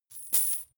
coins drop soft

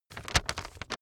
handling paper

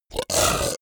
beast choke short

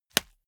small punch fleshy

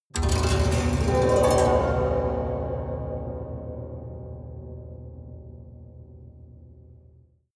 haunted strings up stroke fast hard

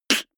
beatbox snare harsh damped

